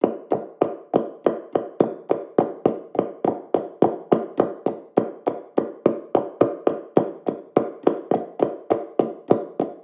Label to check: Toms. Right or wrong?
Wrong